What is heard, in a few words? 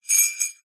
household cutlery teaspoon movement in coffee mug as if cup tipped while spoon in